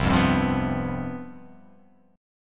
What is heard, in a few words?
C Major 7th Suspended 4th, down